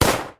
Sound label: M9 Fire, beretta